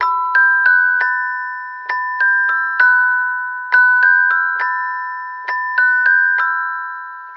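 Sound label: keys music box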